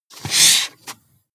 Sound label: marker mark hard